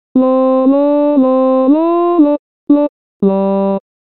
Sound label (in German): Zigeunerlieder ténor